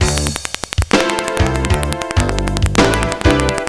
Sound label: Ocean Intro, Jazz; Sound Factory